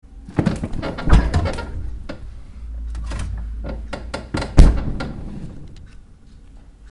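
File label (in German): kühlschranktür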